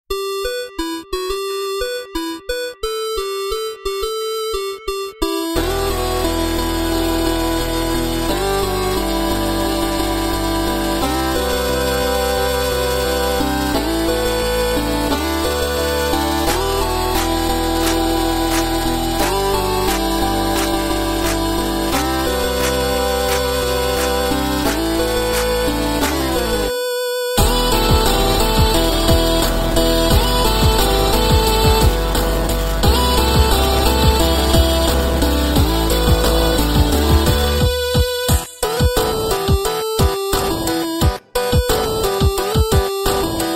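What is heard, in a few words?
8-bit music for mobile phone ringtones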